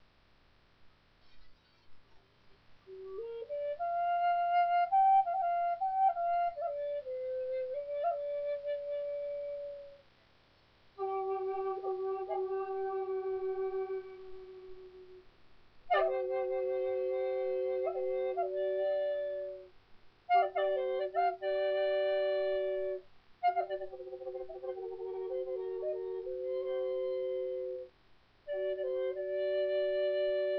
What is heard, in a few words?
Drown G cedar, flutes